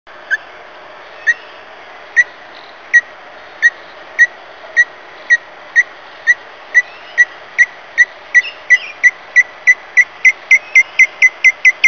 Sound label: black striped sparrow